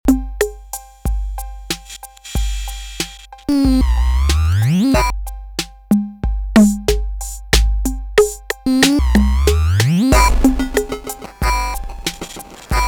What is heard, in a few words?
TR 808 thru HSS2020 effects